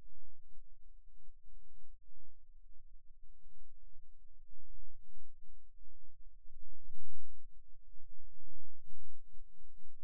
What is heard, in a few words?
Rhythm Wave, eeg seizures